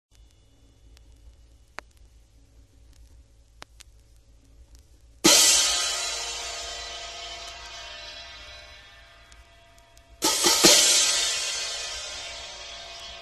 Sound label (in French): Cymbales suspendues frappees charleston gong triangle Percussions